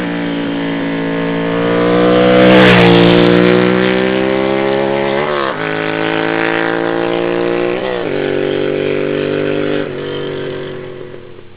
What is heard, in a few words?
duc gone, Ducati